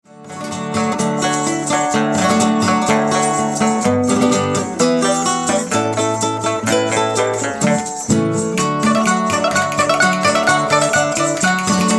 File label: Jingle Bells; Noel